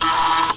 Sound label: 5 inch floppy; pocket calculator 24